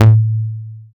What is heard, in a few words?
Blip Sustained A, One Shot Bass